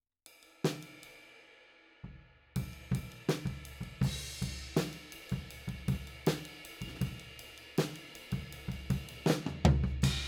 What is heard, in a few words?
Floor, 160 BPM